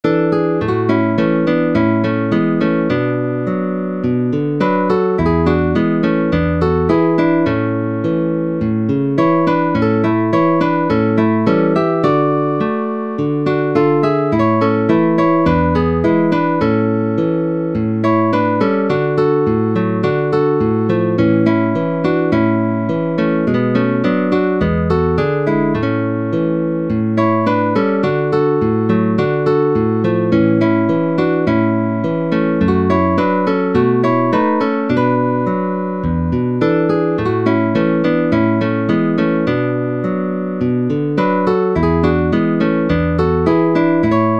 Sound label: Guitar Solo in A Major